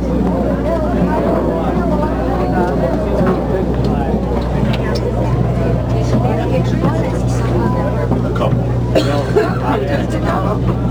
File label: ENV INT getting off tourist boat